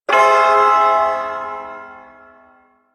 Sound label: Ersatz Shawzin Phrygian Chord